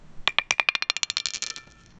three dice rolling and, dice

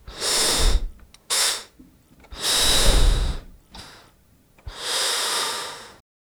airlock pressurizing

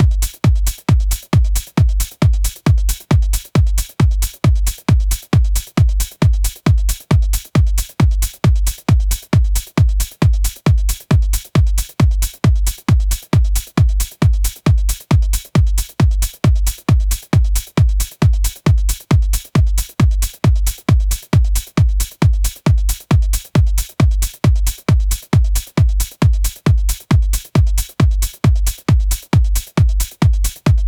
drums for song in disco

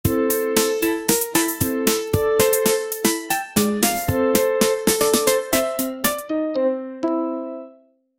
goofy fart based music; parody

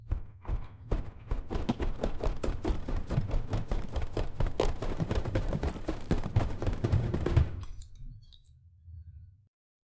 mouse runs toward wall

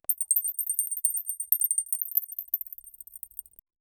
crystals

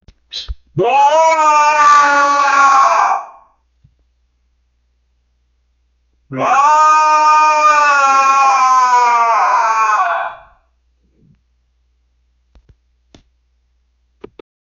a portal and a man

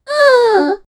silly anime slime female

